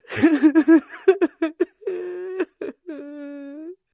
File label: crying, Human Female